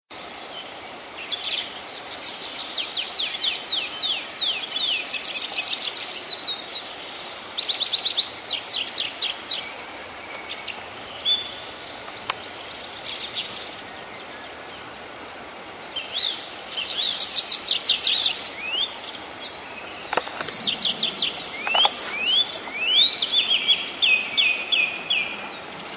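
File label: tree pipit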